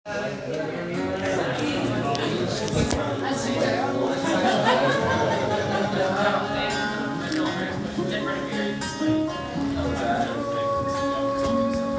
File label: El Camino inside ambience